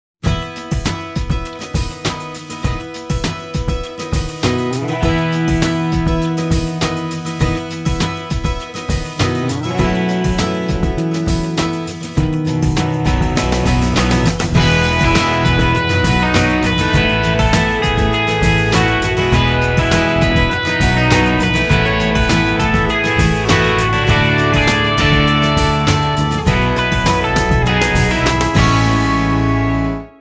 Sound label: Light alt rock